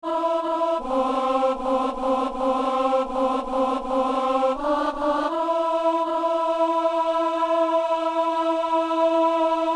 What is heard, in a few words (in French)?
psaume alto; Messe du 17 janvier à Saint-Denis; Psaume 137 (138)